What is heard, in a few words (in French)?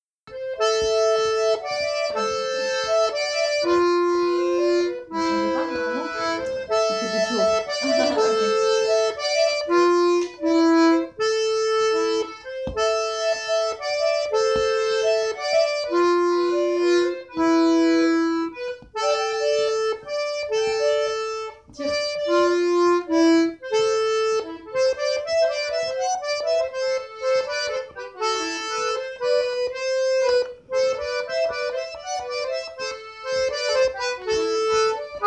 mazurka 2v et bourdon; Mazurka Rigal Voix 2 et bourdon